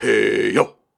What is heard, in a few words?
Grunts and Chants, Viking Vocal